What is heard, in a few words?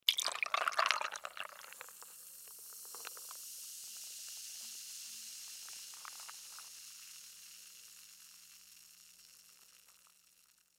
Liquid Ice Pour, Liquid Ice Pour Fizz Glass; Pouring; Foley; Objects; Blastwave